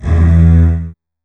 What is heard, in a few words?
DOUBLE BASS